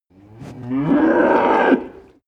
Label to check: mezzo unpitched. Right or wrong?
Wrong